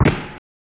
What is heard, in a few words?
bang